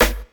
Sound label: raw snare g key, snare drum sound tuned to the G note, Loudest frequency: 2669Hz; snare drum samples; single hits